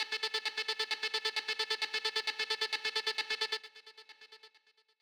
Gated Voice; Construction Kits; 132bpm; Big Fish Audio - Old Skool House; OSH Belgium Beats

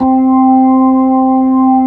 B3, Drawbars; E-MU Producer Series Vol. 2; More Studio Essentials

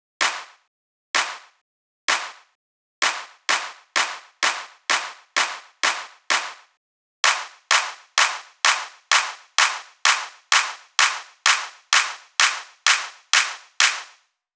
Drum Loop Clap, 128BPM; VEC5 Drumloops; VEC5 Multi Loops 128BPM; Loop Elements

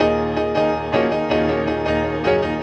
Piano Bounce, Drum & Bass Keys; Sonic Foundry (Sony Creative Software); Knutrix Drum & Bass

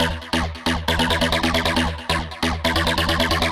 future rave samples, 136bpm, E; Boingo

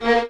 Violins A#3; Miroslav Vitous; String Ensembles

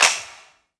CLAP, Dance Drums

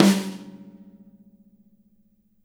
snare drum; Univers Sons; Jazzistic

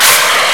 THNDR, FAIRLIGHT CMI IIX, WEATHER

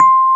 RHODES C6, RHODES PIANO; EdgeSounds; Drum Mashines VOL-1

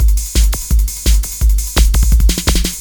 LOOP, Eurotech; Sounds Good

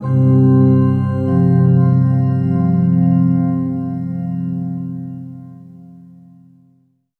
Pitch Vibe, vibes; Sonic Foundry (Sony Creative Software); James Johnson Spektral Minimalism; Sublime Keys

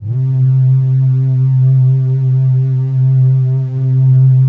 digi pad, Pads

